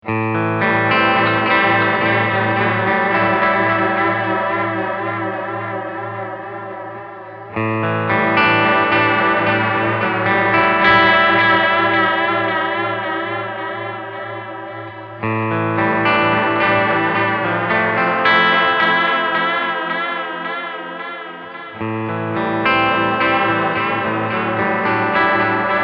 Delay + Chorus Mod

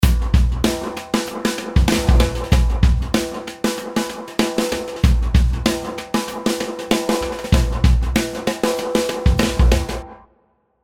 Drums 16th Note Drum Plate

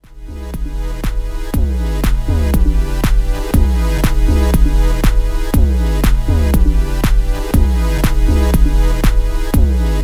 kaossilator2 House

